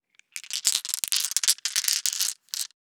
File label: Handling Marbles; The Sound Pack Tree